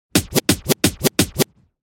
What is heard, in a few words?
Scratch